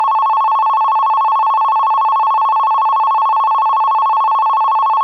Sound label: bell high